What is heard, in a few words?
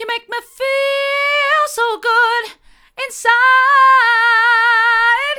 hard, vocal